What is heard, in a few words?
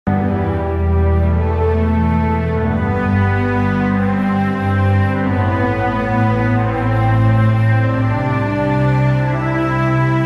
Music Win; Mass Effect Galaxy